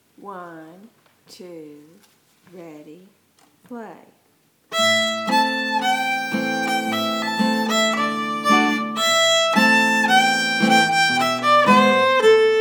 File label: Cluck Old Hen 56 bpm